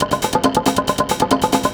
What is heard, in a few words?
drm 138, Techno, drum-loops; Techno ID; tribal-meeting